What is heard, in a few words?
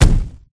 chase footsteps; Mommy